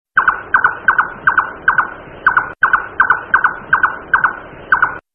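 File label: bird caprimulgus; animals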